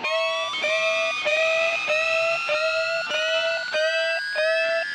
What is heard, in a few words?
panic guitar